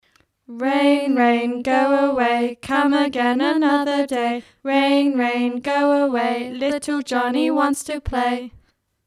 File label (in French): Rain rain go away chante